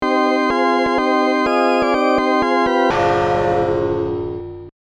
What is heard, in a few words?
Death March; The Town with No Name; Amiga